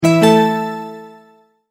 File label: multimedia tone verified